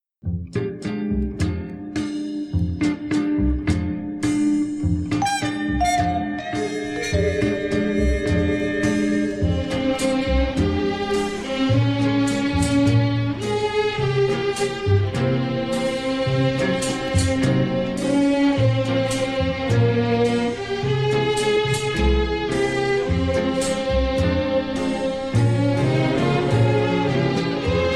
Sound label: strings with brass